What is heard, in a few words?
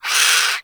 Avichaea Vocal Death; Hunting Critters